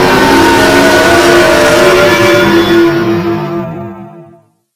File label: jumpscare sound